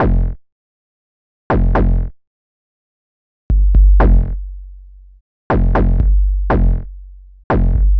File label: Kicks, Modular Electronica; Sonic Foundry (Sony Creative Software); TSS Autopilot